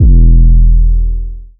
808 - E; 808s; Cymatics; Cobra Hip Hop Sample Pack

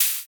OH; Hats and Cymbals

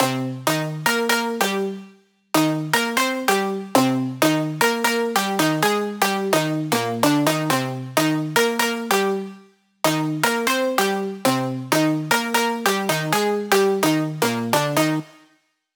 128 BPM C Min Lead; House; Drop Loops; Cymatics; Halcyon

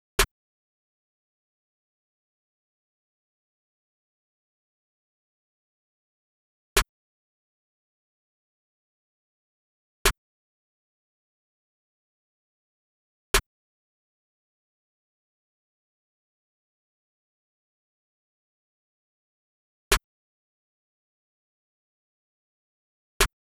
Drum Loop - 146 BPM Snare; Loop Stems; Cymatics; Bands Drum Loop; Mystery Pack Vol 4

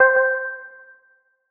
PLUCK Sampled - C; Synth One Shots

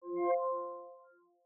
cursor selection; PremiumBeat SFX

